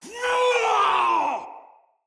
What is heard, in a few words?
Battle Cry, Soldier Male; Barks; Dialog; Dendrit